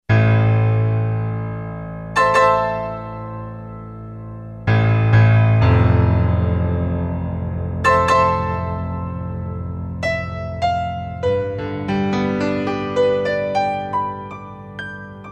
Alesis QS8; TrueStereo; Factory Preset examples

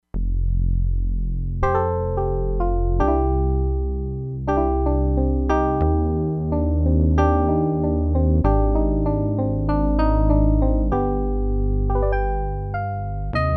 SAWTOOTH DECAY - ELECTRIC PIANO, Crumar Bit 99; Factory Programs

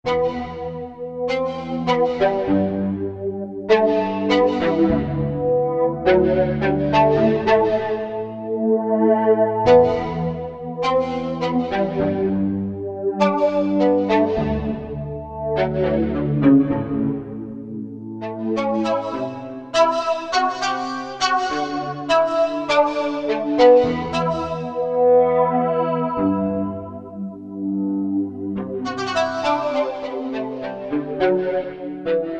Misty Cello; Korg MSC-14S Sound Effects

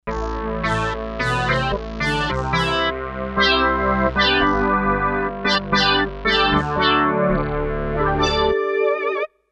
Kawai K5000S; Addilog

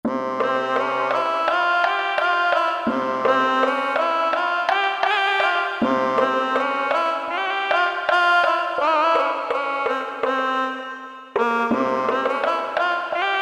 Orientales, Percussion

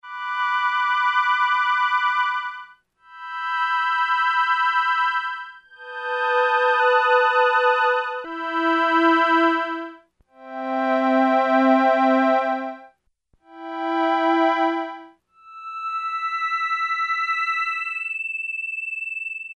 High Strings, Korg Poly-800

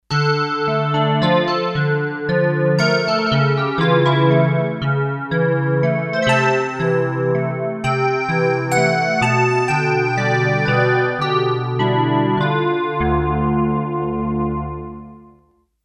Crystalz, Kawai K5000S

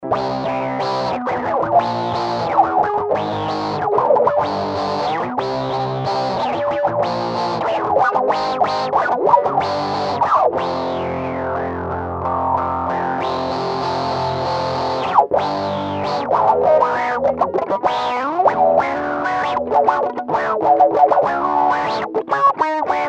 AUTO WAH; Digitech RP200; Factory Presets

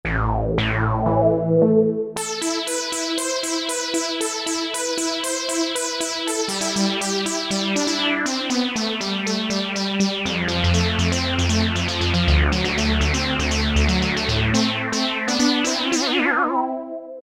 Poly400, Korg MS2000; Factory Patches

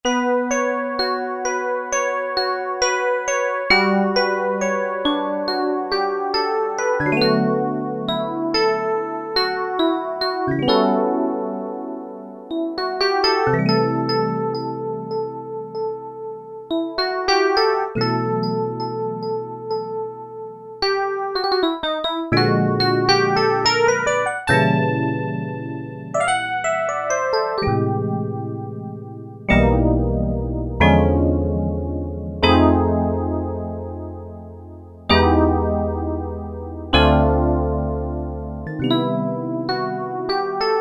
Electric Piano, Roland MKS-70

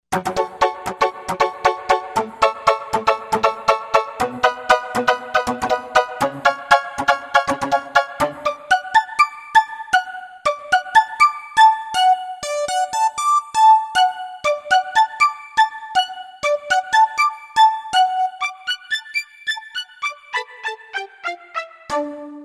Euro Flute, Roland V-Synth; Factory Patches

